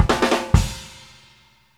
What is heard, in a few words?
FILL END, Grooves Filz Hitz; PlatinumPhattCD1; E-MU Producer Series Vol. 8 – Platinum Phatt